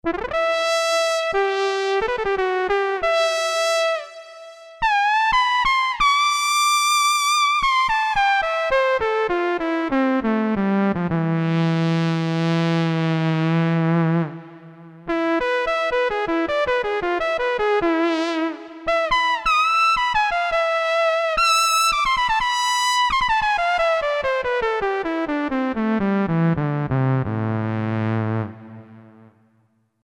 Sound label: GR-300 Lead, Roland JD-990; Vintage Board